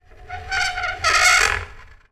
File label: foley wood rail turn squeak; zapsplat